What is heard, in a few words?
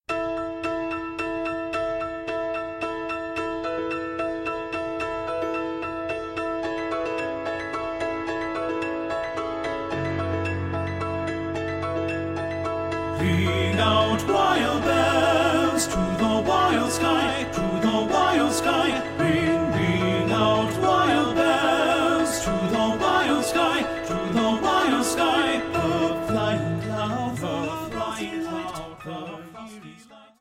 TTBB